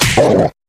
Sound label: grunt hit light; wild worlds